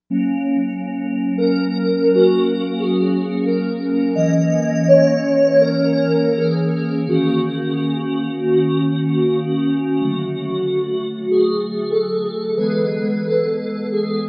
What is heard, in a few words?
Choriana, Korg M1Rex; Factory Patches